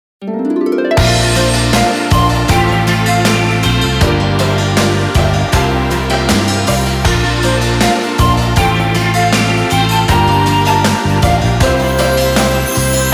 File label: instrumental short ver